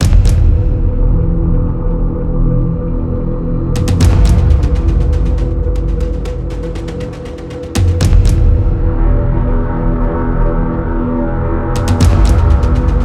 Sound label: Drums